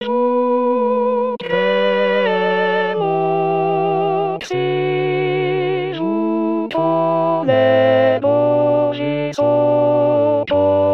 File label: alto